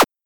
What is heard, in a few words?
hit; OpHog